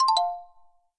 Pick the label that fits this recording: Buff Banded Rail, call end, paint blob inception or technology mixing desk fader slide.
call end